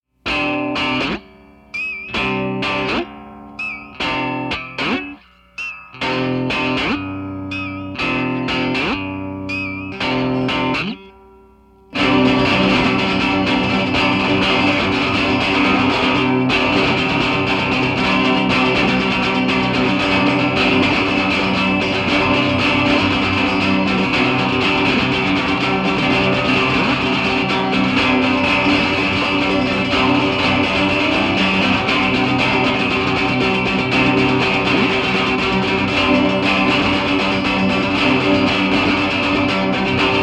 5 String Attack

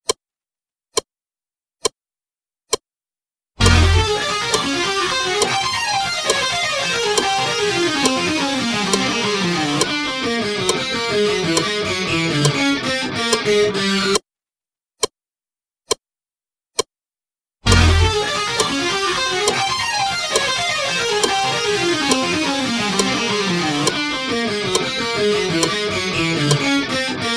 Slow Loop (Minus Drums)

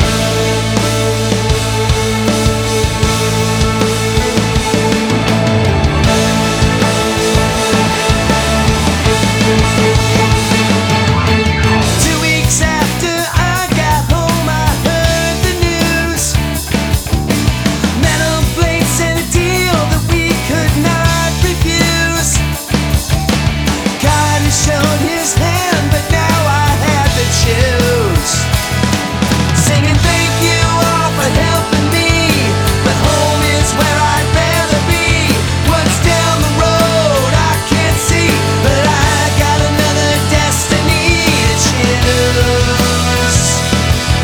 Blues

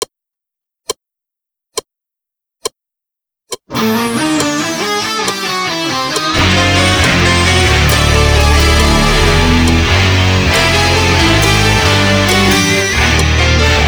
Coda Slow Loop (Minus Drums)

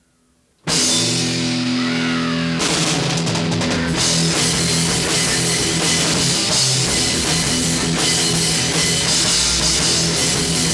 instrumental

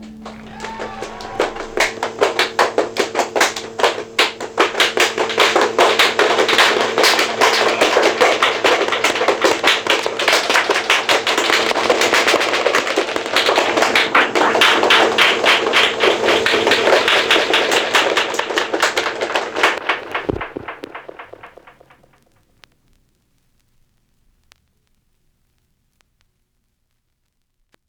applause

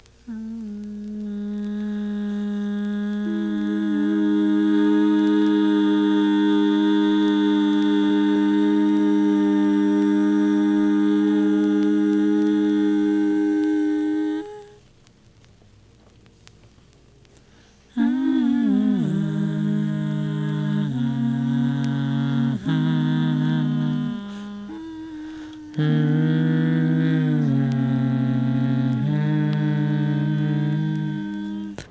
humming

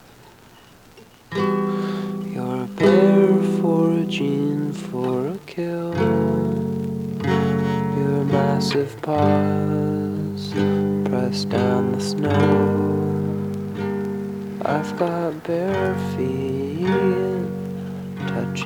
acoustic

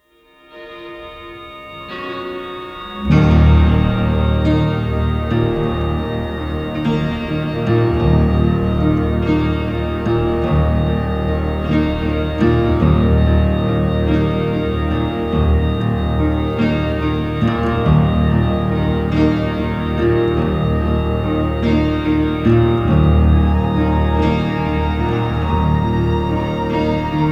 instrumental

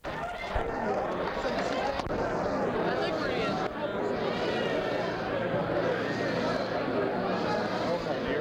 crowd; 1986-04-11 Eagle's Lodge, Colorado

